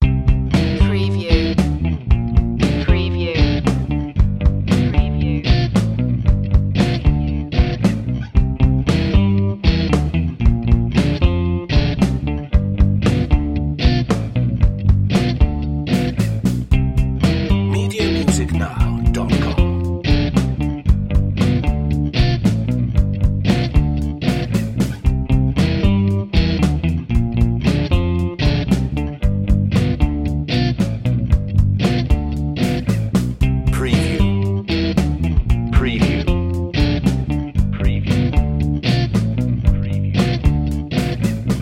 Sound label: childrens soft rock underscore